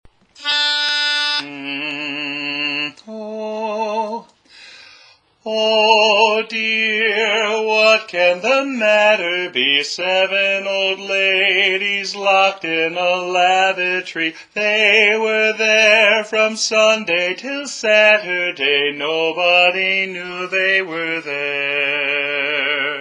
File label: What Can The Matter Be - (Lead)